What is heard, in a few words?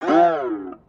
Sheep Boing; creatures organic